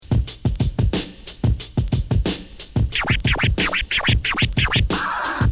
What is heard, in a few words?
baby beat